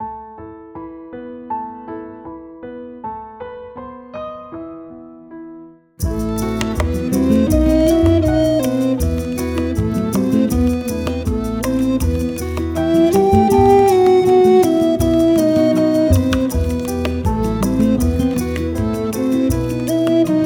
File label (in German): Moll Minis